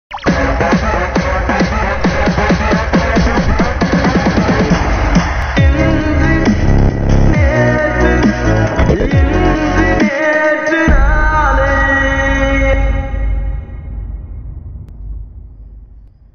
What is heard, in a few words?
title bgm; indru netru naalai; vishnu vishal; mia george